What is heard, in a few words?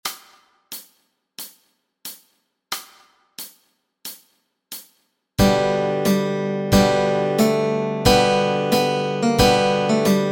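melody and chords, Easy Guitar and Ukulele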